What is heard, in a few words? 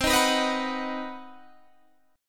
C Minor Major 7th Flat 5th chord, Guitar Standard; strummed; JGuitar